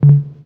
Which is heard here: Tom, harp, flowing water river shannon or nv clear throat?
Tom